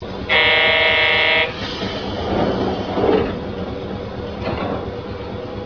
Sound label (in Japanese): densya door sound